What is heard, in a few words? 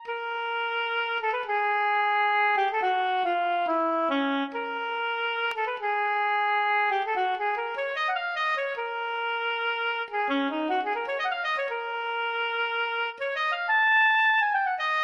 Oboe; DAL Flute; Woodwinds